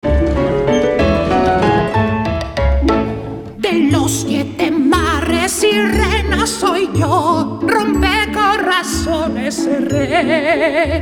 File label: Neutral Spanish LATAM singer